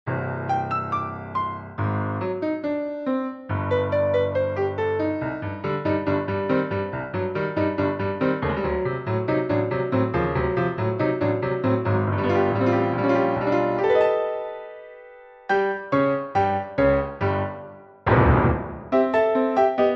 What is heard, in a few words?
audition piano accompaniment